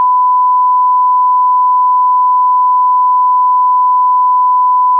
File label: 1 khz; 48Khz; 32bit; test-tones